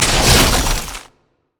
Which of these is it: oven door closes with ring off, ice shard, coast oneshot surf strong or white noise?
ice shard